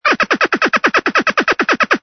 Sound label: ANIMAL CALL